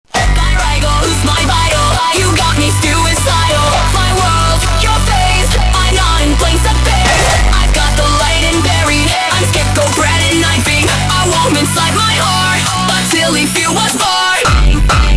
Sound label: hardtekk, funk